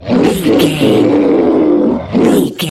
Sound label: troll monster laugh